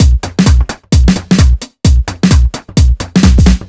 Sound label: No Hats, Drum Loops 130bpm; Zero-G; Soundclash Nu Dance Electro; Acidized Wavs; Riddim Clash